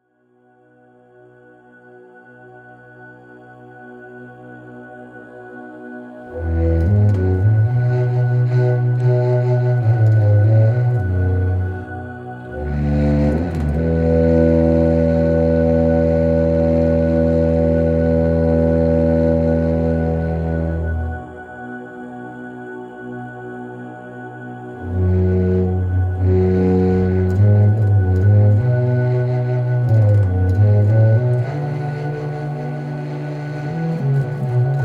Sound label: SOFT TRANCE, circular hocket